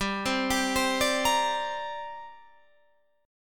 C 7th Suspended 2nd

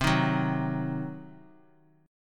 C Diminished C, Bass Standard; strummed; JGuitar

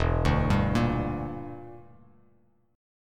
E Minor 7th Flat 5th E down Bass Standard; Em7b5; arpeggiated; JGuitar

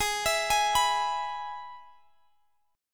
G sharp Minor Sharp 5th, Guitar Open G; x,x,18,21,21,21

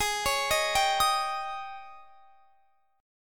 G sharp Major 7th Suspended 4th G sharp; G#M7sus4 Chord

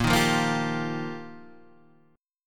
F 9th Suspended 4th Bb, chord

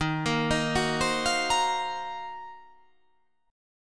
D sharp Minor 6th Add 9th D sharp, chord

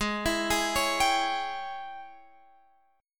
G sharp Major 7th Suspended 4th Sharp 5th

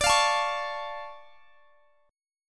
D Minor Major 7th D, guitar; DmM7; strummed

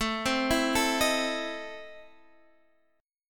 E Major 7th Suspended 4th Sharp 5th, A

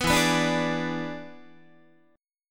E 7th E down Guitar; strummed; 14,12,14,12,12,13

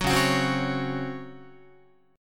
C sharp Major 7th Suspended 4th C sharp Guitar; C#M7sus4; strummed

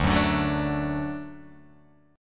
D Major D down, D Chord; D strummed; JGuitar

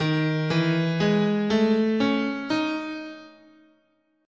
Eb Iwato, scale

